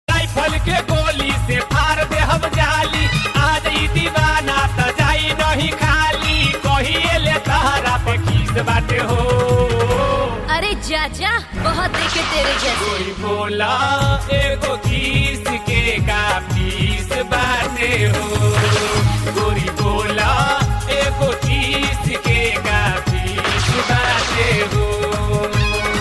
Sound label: BHOJPURI RINGTONE